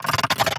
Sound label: Lurker emote; Evolve; Gorgon